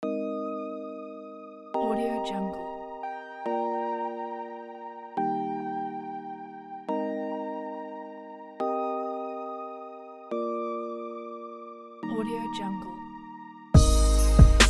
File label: motivational hip hop